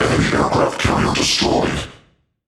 Destroy Enemy Aircarrier; World Of Warships

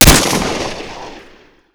scar, weapons; ModernWarfare

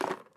knife drop; Escape from Tarkov

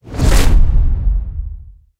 module fire, tanks

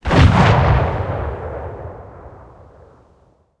cannon 37mm tail far, guns tanks

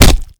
Projectile Sounds; Red Orchestra 41-45

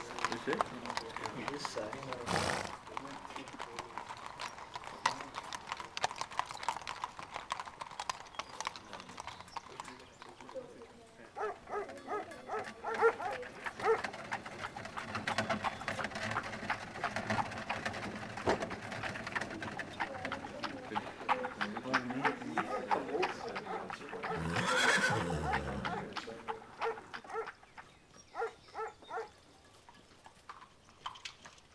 City Middle Small Bed; Ambient; Civ4 warlords

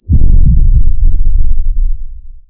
cannons sub

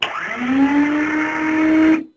Shut-off Valve Open, Aircrafts; DCS

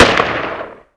Inf Weapons; Red Orchestra 41-45

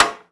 Aircrafts MiG-15bis Ratchet; DCS

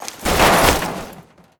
big jump try grab; Modern Warfare

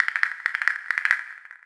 castanets; ambient; World of Tanks Blitz; pliego

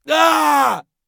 death; Escape from Tarkov

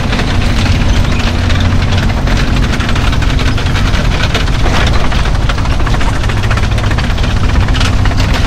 tracks, engines tanks; War Thunder; maus

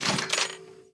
12mm dshk mech, guns tanks

